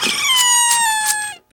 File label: Voiceless Crying Weirdly; EDDY; Adromulus Pack; EEnE